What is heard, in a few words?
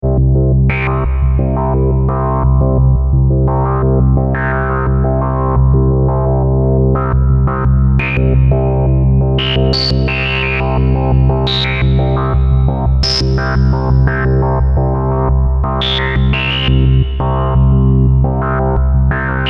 Pro-One; tellun